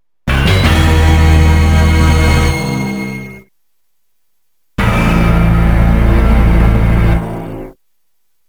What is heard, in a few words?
Fatality Music; Mortal Kombat 3-Trilogy Fatality Music